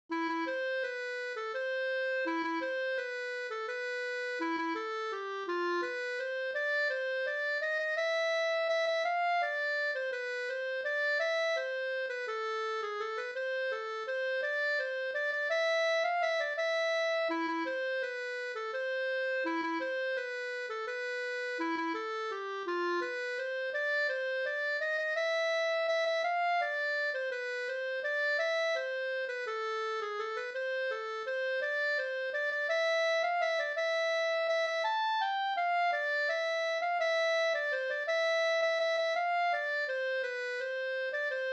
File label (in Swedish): vals A moll